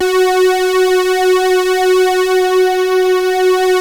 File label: JUN 106, BASSES